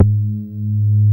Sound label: BAS Mini; Best Service Gigapack 2